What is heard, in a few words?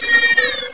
alarm